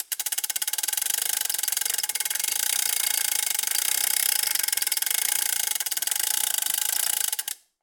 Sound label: Industry Chain Retract Gear Crank Winch Long